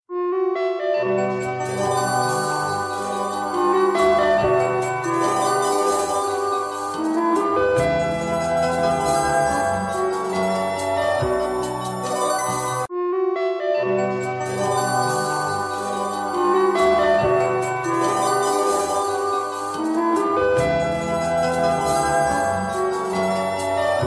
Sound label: Quite Alarm